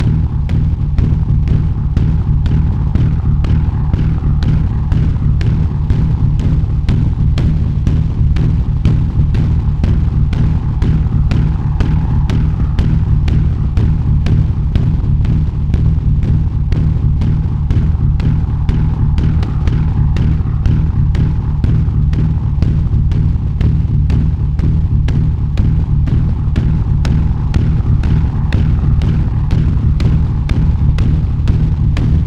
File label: pulse